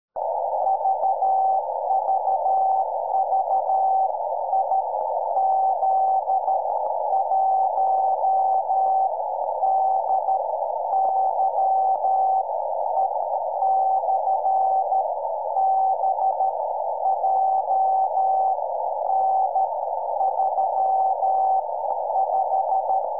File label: BEACON; IZ1DYE; 4m